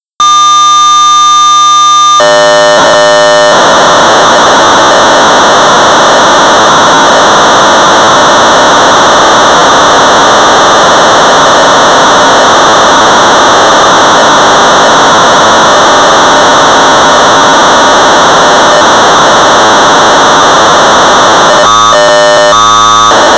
Sorcerer 1200baud; Air Traffic Control (later version); D.Mannering

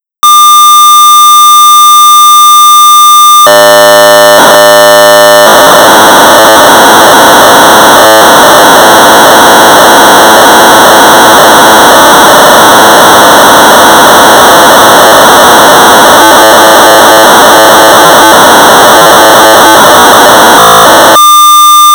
Sorcerer 1200 baud; Sets1; Sets for Preschoolers; BYTE; BASIC

